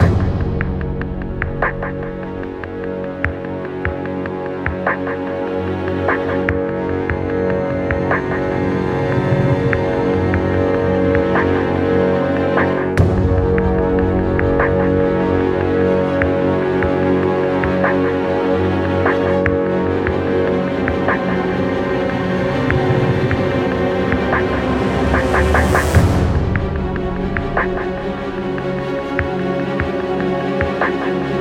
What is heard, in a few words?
Stealth Loop; Chasing Dead; Wii U